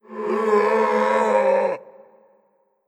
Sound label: Skell Scream; Necro